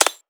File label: Percussion, Hip Hop, Drum One Shots; Cymatics; Cobra Hip Hop Sample Pack